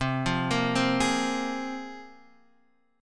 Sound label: C 7th Suspended 4th Sharp 5th, Guitar, Open D